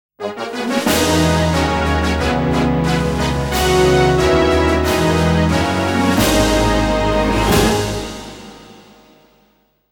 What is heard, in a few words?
End Fanfare